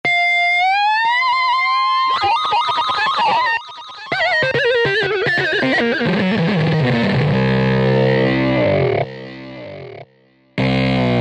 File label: Howling Lead, Roland V-Synth; Factory Patches